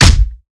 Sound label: Melee Impact Base; Evolve; Behemoth